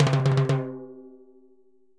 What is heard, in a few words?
Timbales L Roll, Latin Percussion; Drum Hits; Ableton; Factory Packs